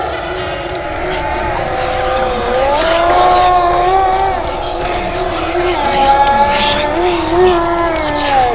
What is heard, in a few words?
boo hell bg